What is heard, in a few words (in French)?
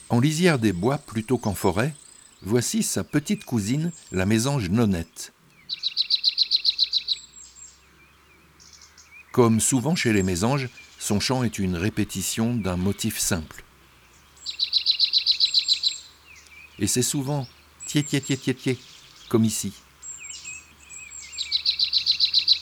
La Mesange nonnette; chants d'oiseaux